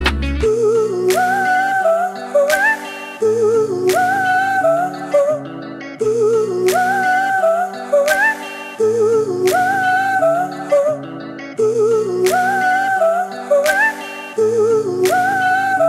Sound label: instrumental bgm